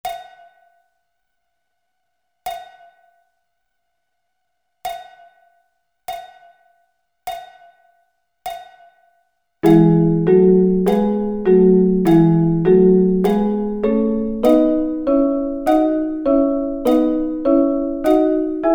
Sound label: E Double Stops